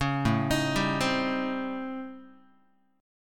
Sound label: A 7th Sharp 9th Flat 5th, Guitar; A7#9b5; arpeggiated; JGuitar